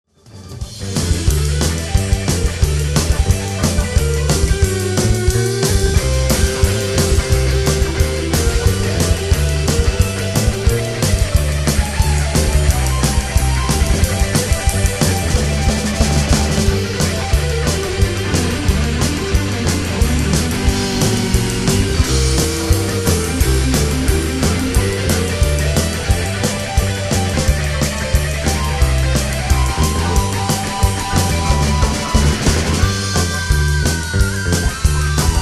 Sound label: guitar solo